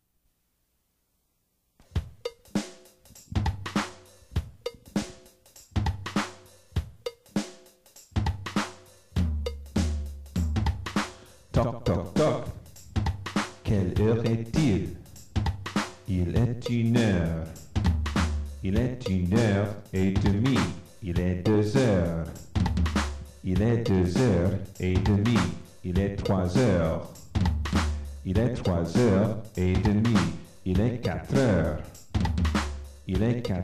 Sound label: toc toc toc rap, French